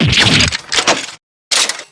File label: damage; dgs2-drebber